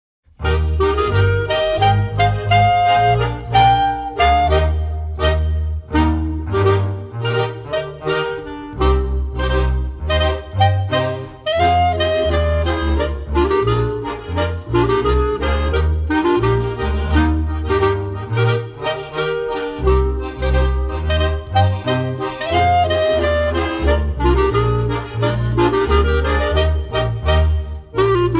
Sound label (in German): Alti Baselbieter Chrüzpolka, Volkstaenze Tanzmusik; Baselbieter Kreuzpolka; dance